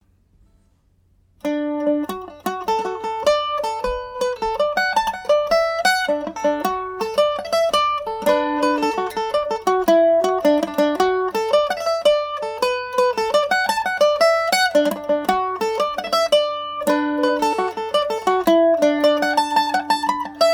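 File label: carraroe jig d major revisited, mandolin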